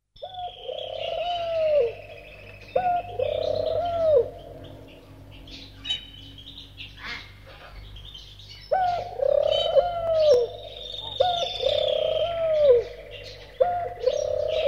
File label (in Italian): tortora, versi degli animali; animalandia